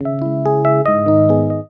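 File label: electric piano toot; Musical Instruments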